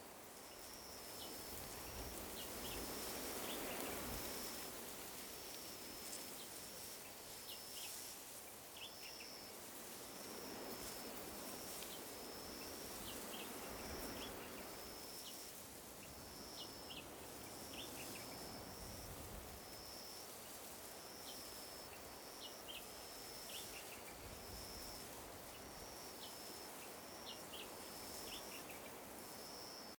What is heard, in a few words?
outdoor observatory night